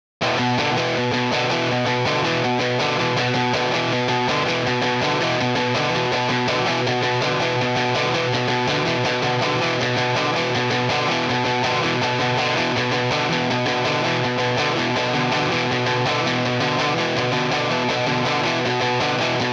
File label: Seven Git; Seven creatures down